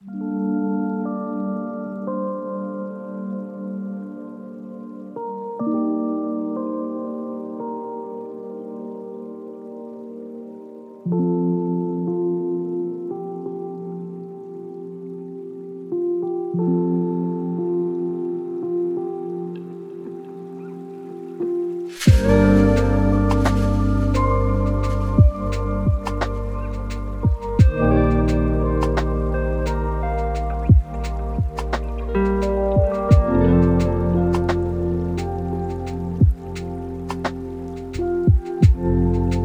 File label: lofi hip hop